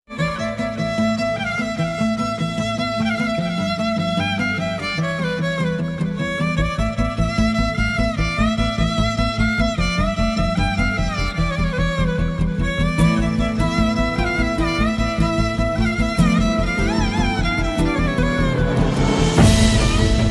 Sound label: emotional bgm ringtone